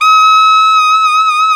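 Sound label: SAX FLA, alto sax D3; Best Service; Alpine Volksmusik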